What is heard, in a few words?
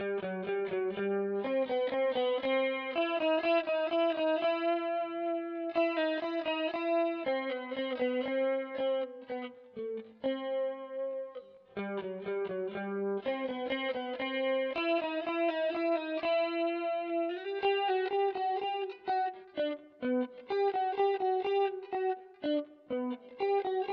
Chorus Reverb